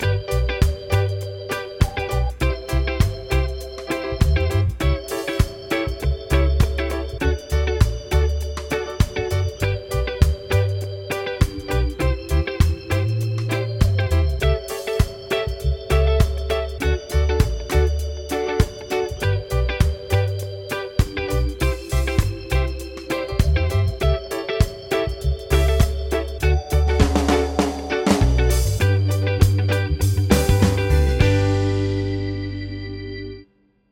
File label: progs with applied chords